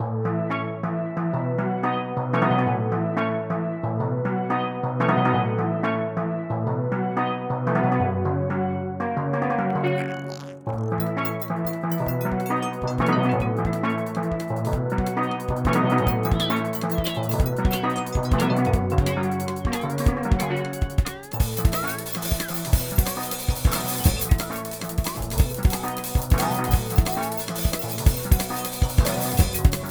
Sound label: another caribbean beat